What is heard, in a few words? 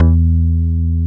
PULL BAS; Synclavier Sampler Library; KEYS AND GUITARS